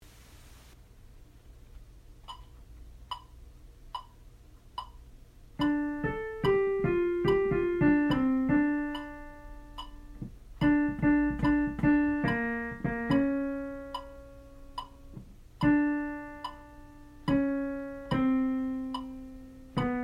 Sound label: Alto